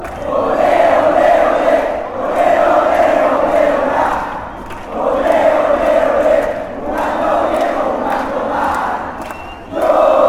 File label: deportivo cali chant